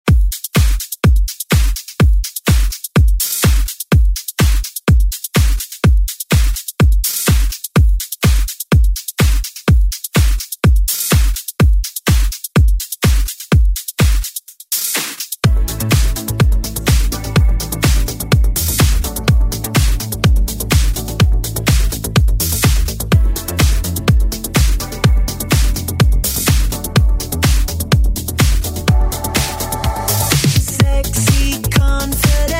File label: Club Clean